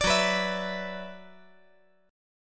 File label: Gb 7th Suspended 4th Sharp 5th Gb